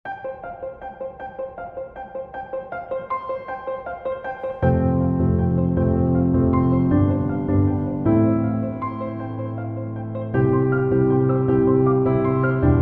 Steinway

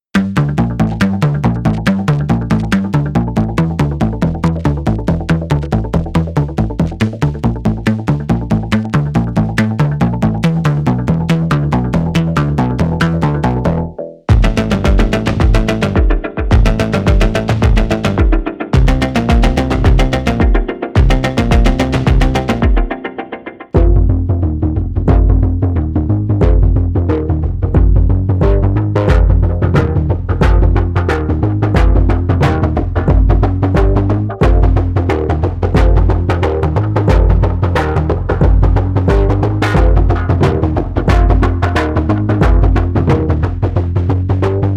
rootbass arps

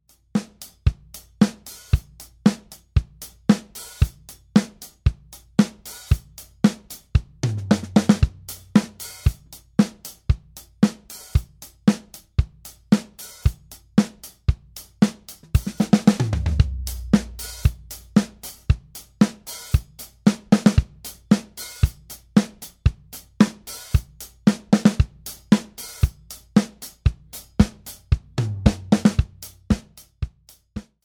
80s pop

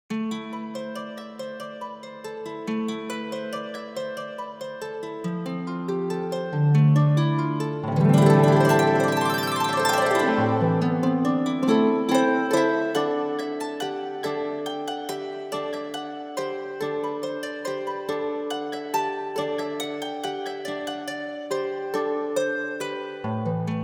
harp medieval village

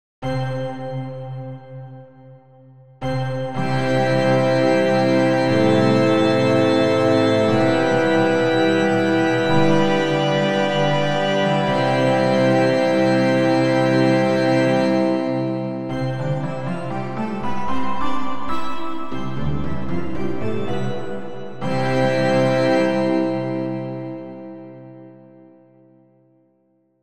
church organ long reverb

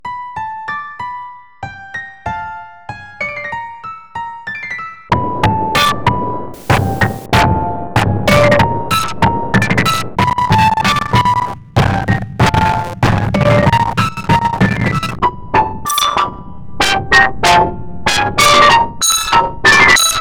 piano

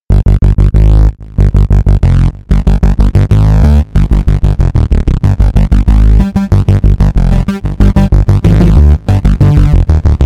bass

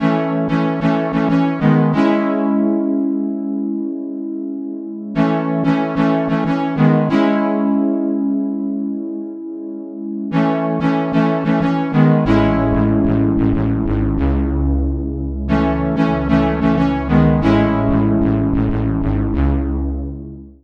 brass

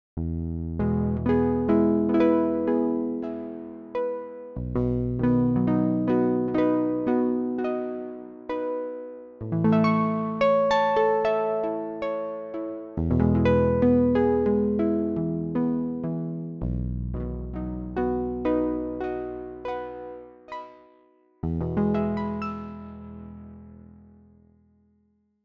harpies

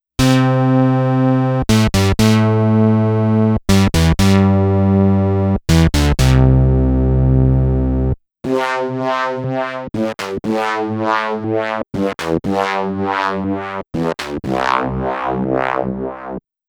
didge; wrecker audio examples

